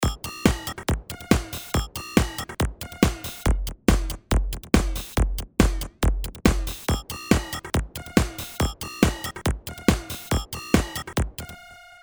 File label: Dance; RP Punch; Punch Dance example 6